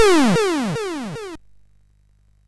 sid MOS6581R2; hvsc

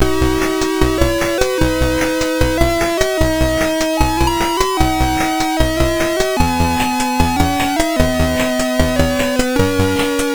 sid MOS6581R2; Gryzor; hvsc

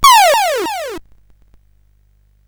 sid CSG8580R5; hvsc

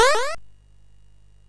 sid MOS6581R2; Gryzor; hvsc

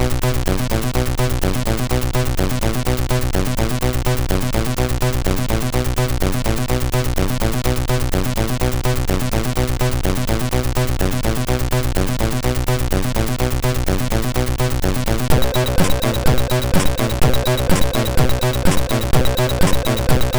sid MOS6581R3; hvsc

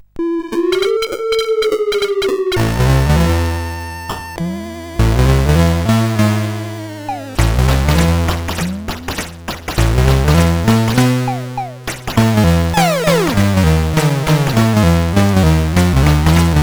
sid CSG8580R5; hvsc

